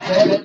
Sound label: Shroom Voice